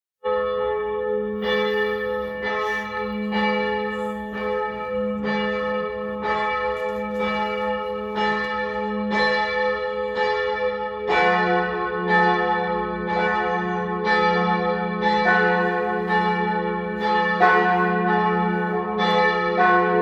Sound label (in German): Kirchenglockengeläut, Martinskirche Weil im Schönbuch; Weihnachtslieder